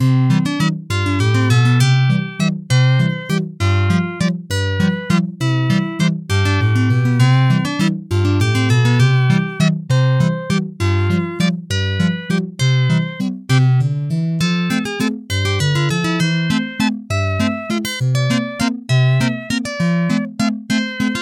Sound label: (unreadable music) (busker version); intradiegetic